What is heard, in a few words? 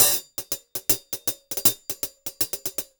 080, Top Ends, Beats; Zero-G; Monster Beats